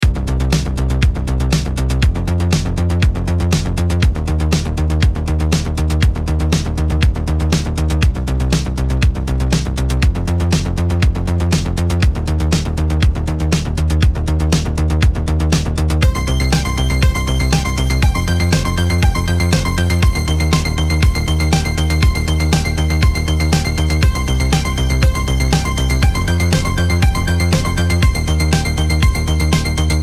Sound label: dungeon music